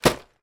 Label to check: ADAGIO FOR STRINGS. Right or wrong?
Wrong